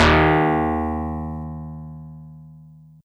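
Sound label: Easy Clav DX100 D#2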